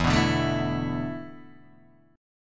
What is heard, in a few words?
E 7th Suspended 4th, Guitar, DADGAD